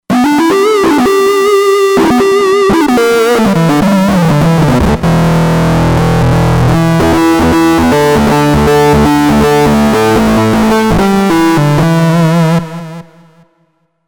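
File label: lead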